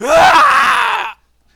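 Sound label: death, male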